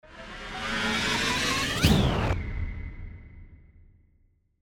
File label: Burst Whoosh